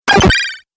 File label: 8 Bit Aggresive Laser Bleep; Video Game Sound Effects